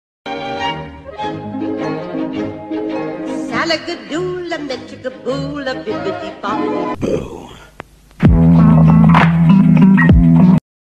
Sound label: scary; tiktok